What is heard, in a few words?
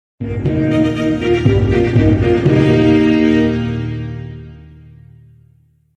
legendary notificator; margonem